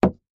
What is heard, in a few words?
glass on table